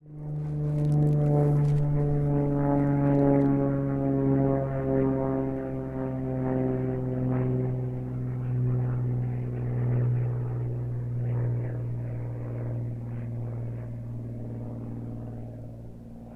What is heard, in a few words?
Plane Flyover